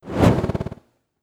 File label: fireball; Politburo